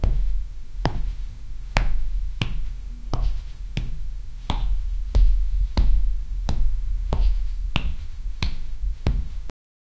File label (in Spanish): entre sus pasos se escuch, dance